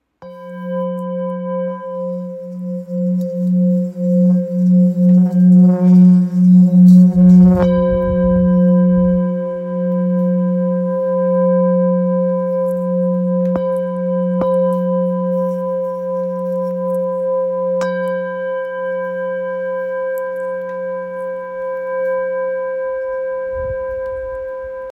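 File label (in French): Thérapeute Fa 0,925g, diapasons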